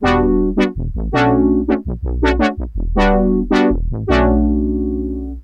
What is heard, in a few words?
brassy